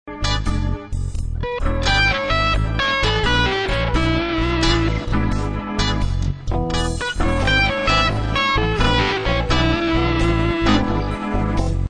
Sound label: blues